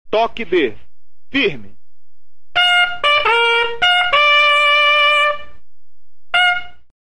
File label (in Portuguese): firme, toques de corneta